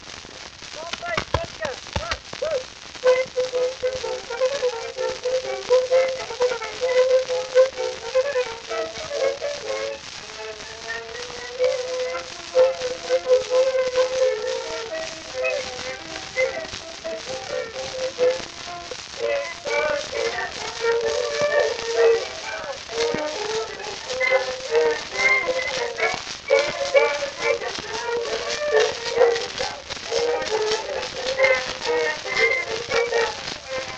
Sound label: Fanfare